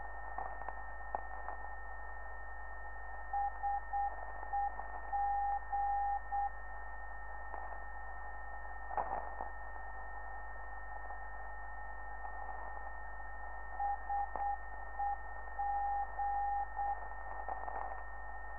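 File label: seg 456khz, non-directional beacon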